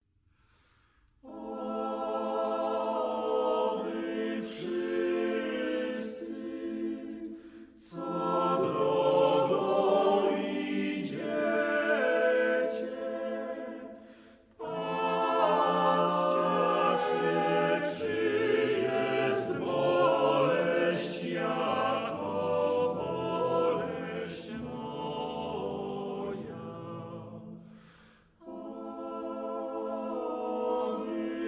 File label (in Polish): Lament Maryi piesn